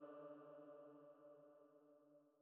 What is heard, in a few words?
Female Pad D2; KG MegaSaur